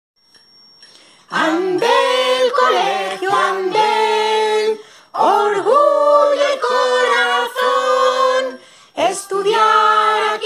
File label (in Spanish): Himno Musica; Himno Andel